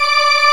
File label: Organ, Barton+MortonMel